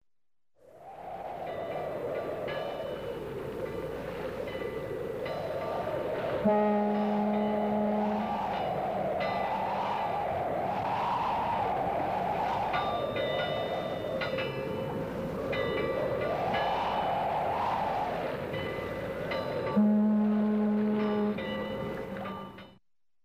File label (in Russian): port obshaya atmosfera gudki korablej silnij veter